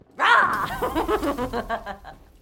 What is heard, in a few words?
rawr